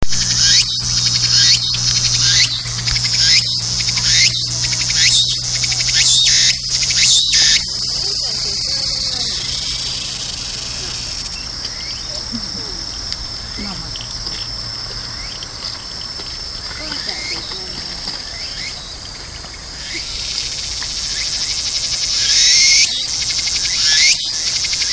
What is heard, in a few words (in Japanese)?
tukutukuhousi, insects